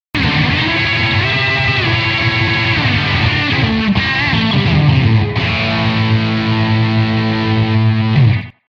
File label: blues dive